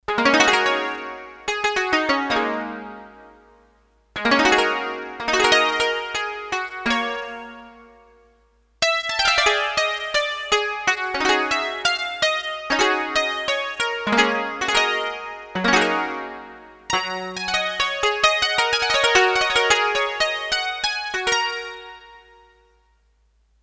Koto, Roland D-10; Factory Patches

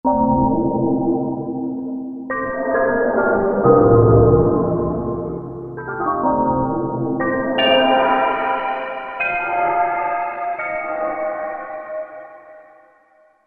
Flange Gong, Korg MSC-8S Percussion

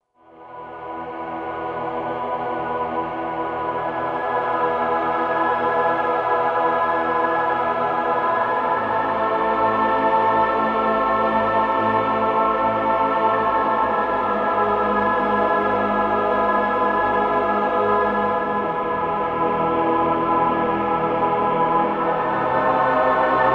Tron Choir; Roland SR-JV80-04

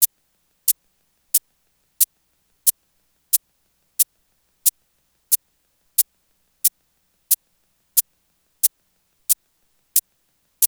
TR-808 Maracas; Roland; Instrument samples

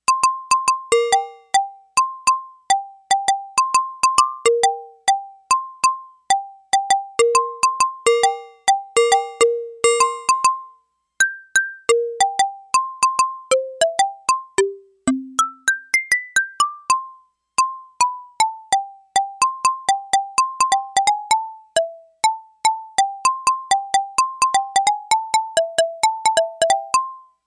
Agogo Bell

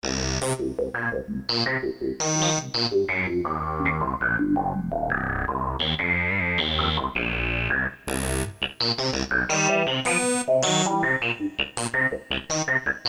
S&H, Synth Bass, Korg DSS-1; Synth Bass & Drums

